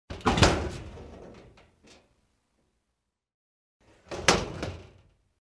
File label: The metal cabinet door opens, closes